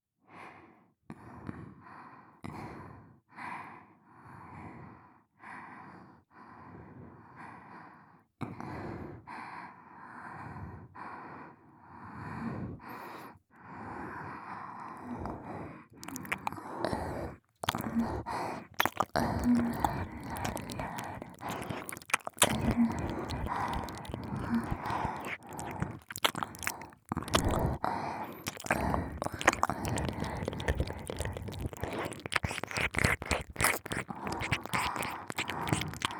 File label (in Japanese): 両耳舐め; ASMR